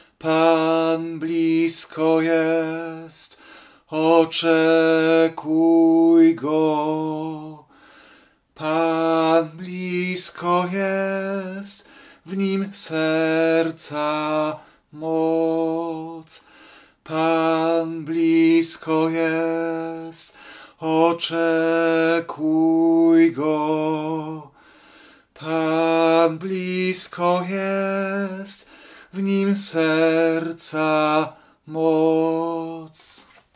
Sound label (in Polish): Alt; Taize